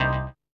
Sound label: HOUSE, House Dance